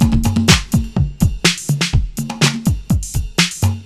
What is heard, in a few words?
Conga Magick, Drums Beats